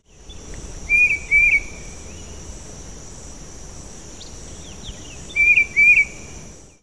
Tufted Titmouse

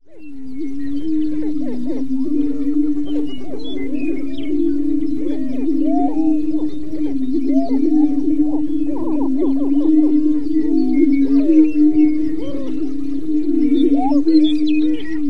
Greater Prairie Chicken at lek; Bledsoe Ranch CO

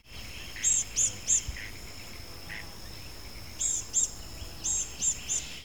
Blue gray Gnatcatcher; Arbuckle

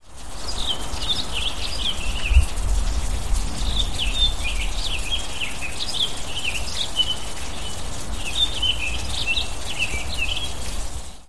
Townsends Solitaire

